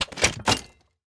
Rocket Launcher Equip; Items; Shooter

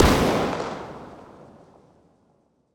grenade explosion medium sized

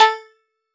roulette

